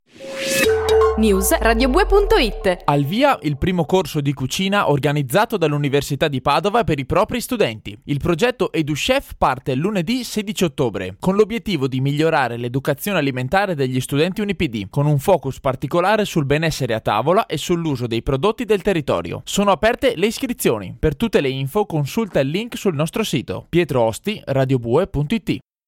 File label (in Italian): jingle; educhef